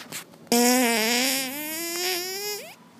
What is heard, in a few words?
Ponderous, fart